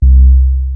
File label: Sub Sonic Bass C2; E-MU Formula 4000 Series Vol. 1; Hip Hop Nation